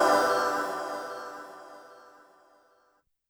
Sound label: Vox Bell L, YAMAHA RM1X